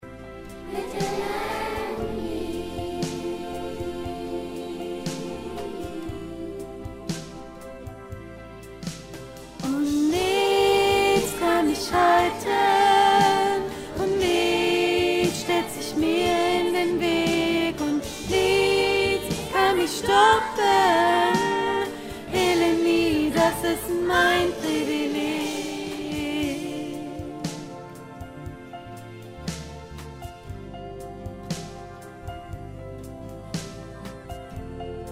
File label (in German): Chorstimme Ilemie mittel; 2. Mittlere Stimme; Die Musik-Insel